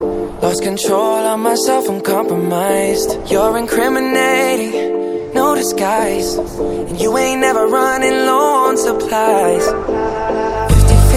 hollywood ringtone

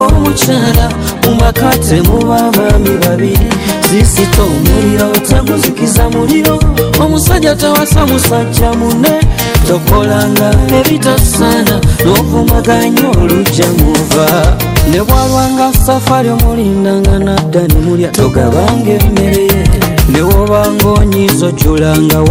ugandan ringtone

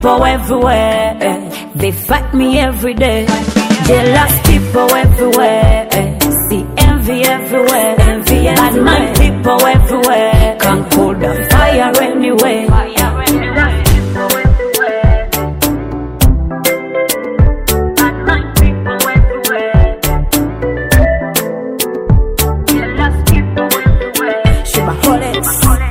ugandan music ringtone

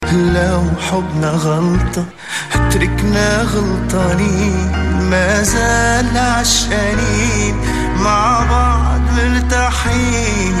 sad love song arabic

